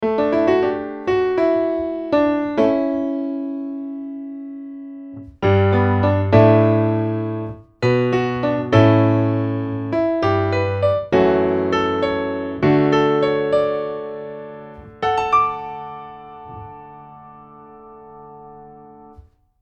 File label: Bechstein Top